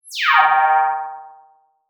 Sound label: beam